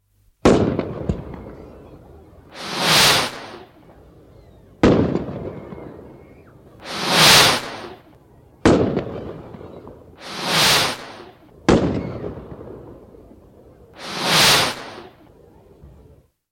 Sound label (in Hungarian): tuzijatek